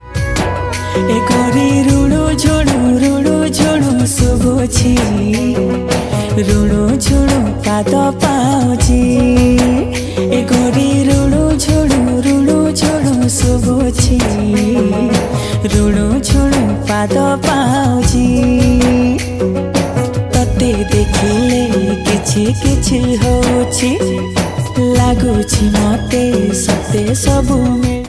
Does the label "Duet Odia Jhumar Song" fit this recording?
Yes